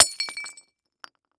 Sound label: Impact Glass Cup Fall To Cement Doesn't Break